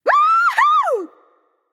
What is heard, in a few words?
nv yay; smite; awilix